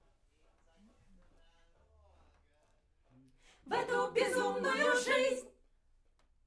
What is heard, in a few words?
Backs; SCHOEPS